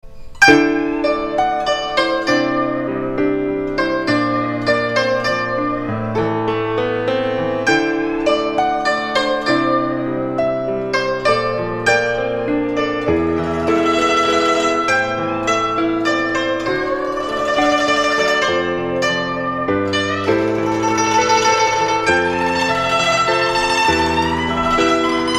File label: Guzheng